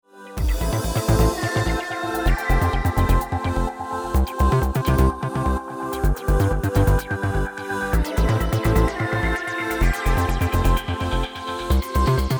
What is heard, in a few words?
EDM